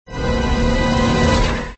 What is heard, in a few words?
Close; FreddyVSJason